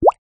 bubble

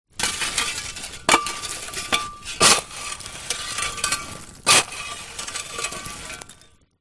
industrial shoveling hot coals fire; zapsplat

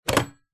household alarm clock with bell knock over table

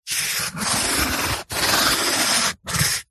office marker pen on cardboard line

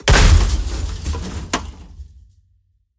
Impacts processed; soundbits

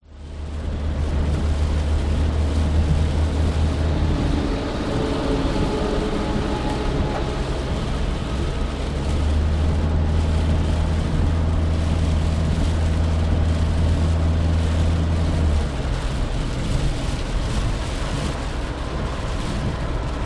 transport car drive rain fast internal

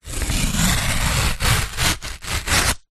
paper several sheets thick rip tear; foley

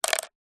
foley pebble very small drop onto others in pot; zapsplat

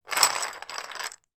game connect 4 playing discs pick out of box

